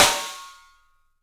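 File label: PICOLO SNRS, snare, drums; 3D Sound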